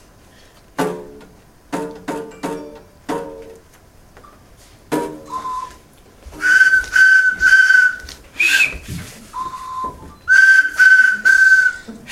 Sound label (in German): karottenorchester